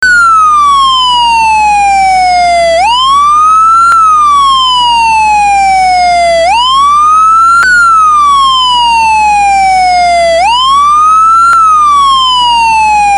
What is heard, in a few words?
Police Car Siren; Sirens